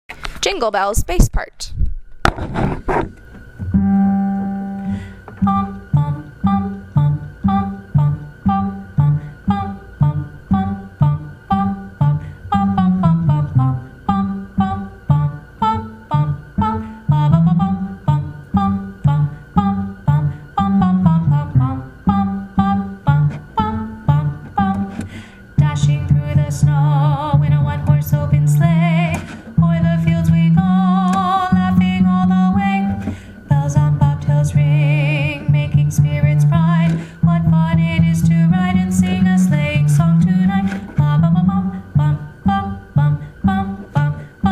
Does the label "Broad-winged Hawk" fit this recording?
No